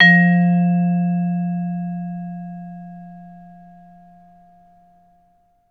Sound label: VB LONG F2, Advanced Orchestra; Best Service